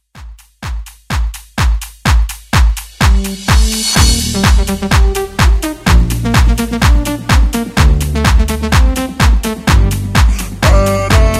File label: Party Starter Redrum